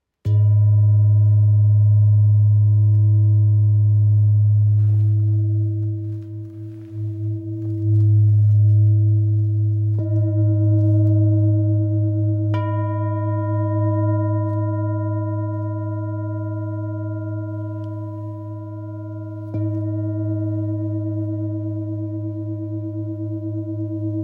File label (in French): Bol grave sol diese fleur de vie